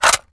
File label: MagOut End; weapons; guardian